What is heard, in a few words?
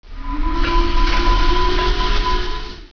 missile turret; StarCraft v2.2.2; terran units